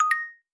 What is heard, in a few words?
new message